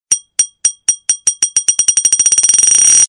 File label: falling crystal ball phone tone ringtone